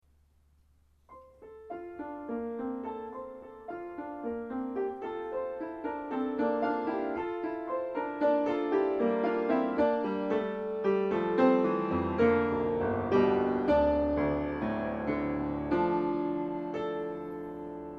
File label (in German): Kleine Klavierstuecke, piano